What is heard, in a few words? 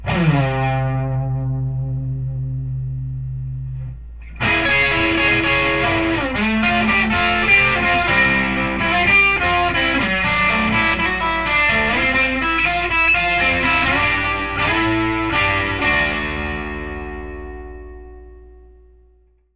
Zipper Riff